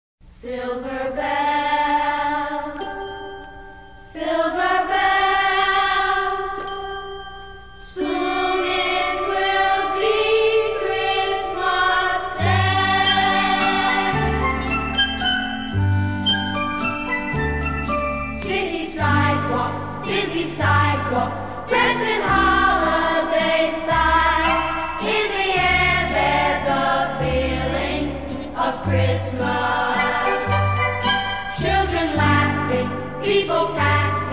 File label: Children's Vocal Group with band